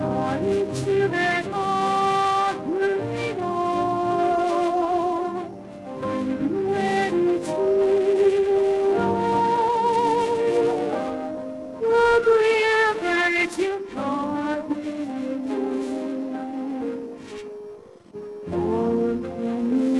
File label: Classic Pop; mononoke